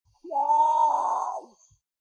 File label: potoo maawwwm